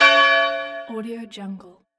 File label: church bell